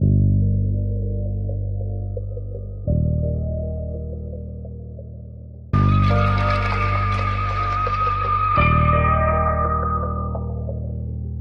keys

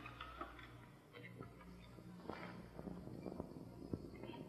line noise, Vinyl

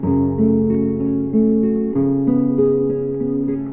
guitar, Sad

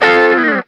plucked up, Stabs

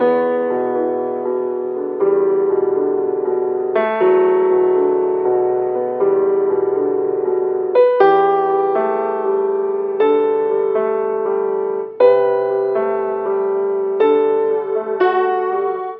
piano warped, Sad; Loops

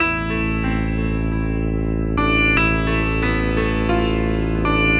piano and keys, Sad Loops

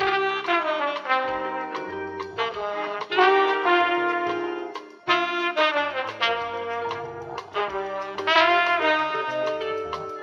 horns, phonky loops

